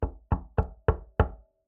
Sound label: knocking on heavy door; sonniss gdc